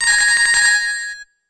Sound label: Ring loss; SADX